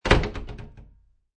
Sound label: Door Close Wooden Door, Household, Door Close, Wooden Door; Miscellaneous Door; Miscellaneous Doors